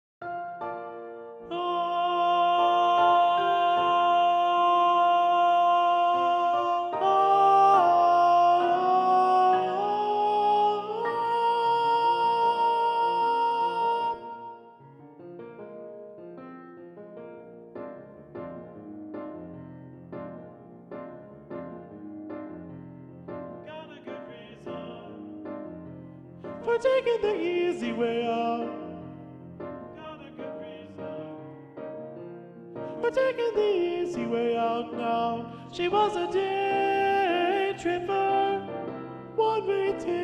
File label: satb soprano predominant; Sopranos